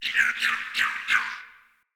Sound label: Cardinal Decoy Call; Objects; Thawtide